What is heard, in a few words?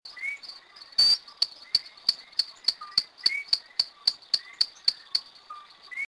Eleutherodactylus locustus El Yunque National Forest Puerto Rico; north-eastern Puerto Rico; its call can be heard in open mountain meadows and areas with abundant, low ferns; It consists of a short whistle followed by a series of "ticks".; rather rare species; West Indian